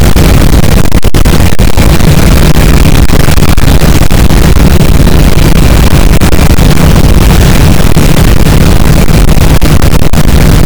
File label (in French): cachalot positif, boat; Physeter Macrocephalus